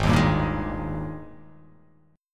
Ab Major 7th Suspended 2nd Ab down; strummed; JGuitar

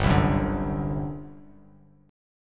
G Major 7th Suspended 4th, G, down, Bass Standard; GM7sus4 strummed

